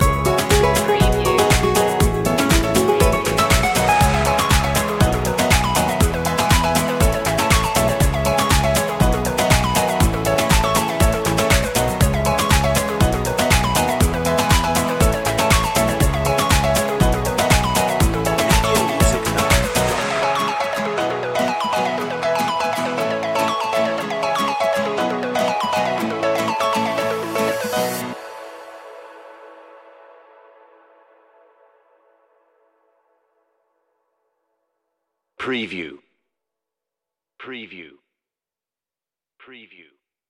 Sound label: guitar; media music now